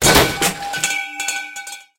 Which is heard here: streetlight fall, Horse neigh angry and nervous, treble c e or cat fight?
streetlight fall